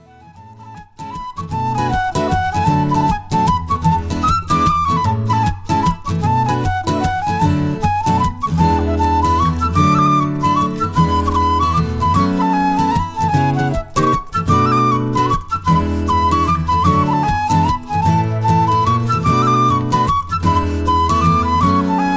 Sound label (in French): Mazurka; Mazurka de Chabrier